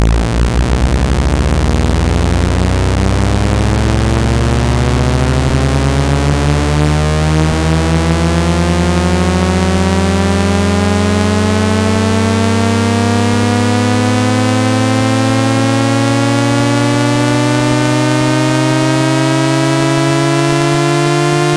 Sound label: FREQ LIN EXP 55 880 SAW